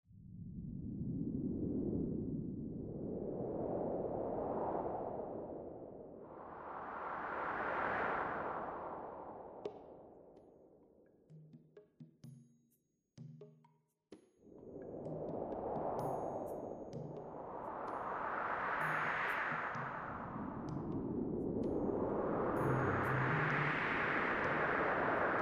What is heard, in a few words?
startup